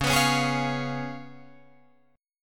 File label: C sharp 7th C sharp, Guitar Drop D